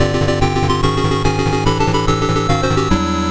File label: Start